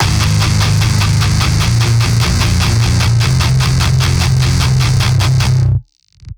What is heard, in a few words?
Guitar Riff